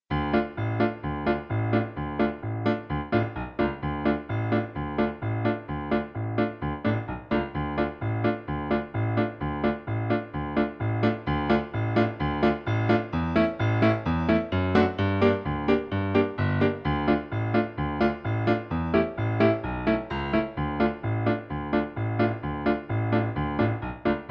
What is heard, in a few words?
piano; Bela Ciao